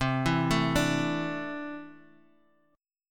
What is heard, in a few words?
C 6th Add 9th C down Bass Standard; C6add9 arpeggiated